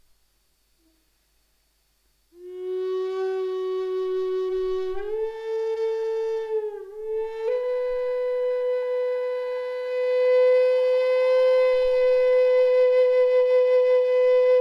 F Drone Kauri, flutes

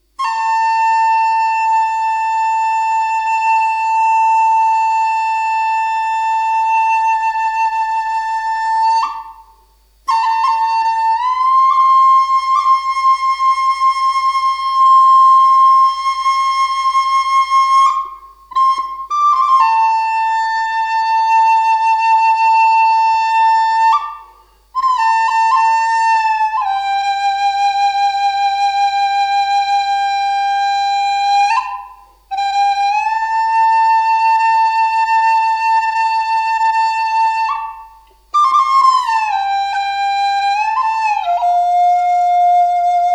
Dm Forest Flute Rimu Left offset holes

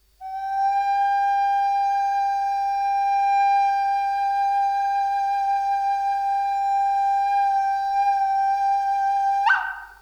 Gm Forest Flute Puriri with Walnut

